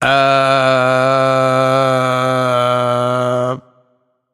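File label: seriously, nonverbal; smite; voicepack; khepri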